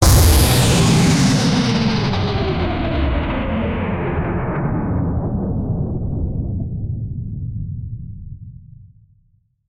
engine of a rocket space

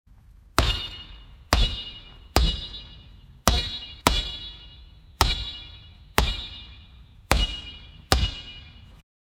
basketball sounds

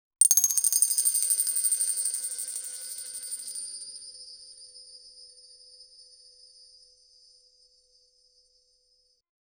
vintage cartoon style sho; 1930s

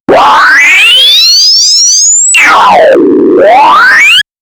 computer

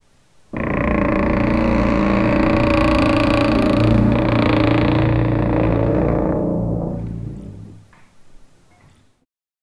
giant stone door struggle

